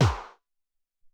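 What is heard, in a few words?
SR16 Snare, Alesis SR-18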